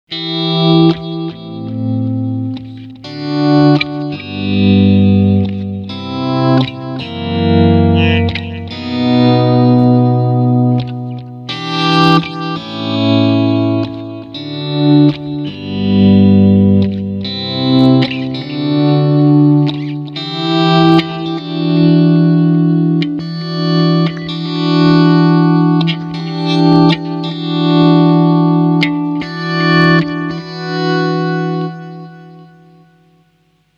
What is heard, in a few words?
Auto Volume Echo